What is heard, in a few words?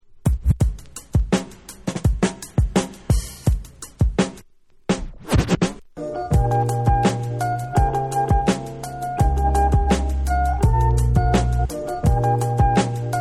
funky